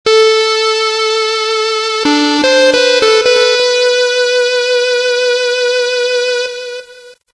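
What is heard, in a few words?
square wave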